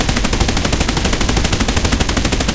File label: loop hispano suiza 30mm, guns tanks; War Thunder